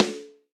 Snare Rim Old School; Drum Hits; Standard Hits; Ableton; Factory Packs; Session Drums Studio

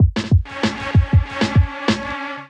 Kit Melodic 96 bpm, Drums; MIDI Clips; Blueprint; Ableton; Chop and Swing

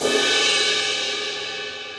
Suspended Cymbal Stick Hits; Ableton; Orchestral Mallets

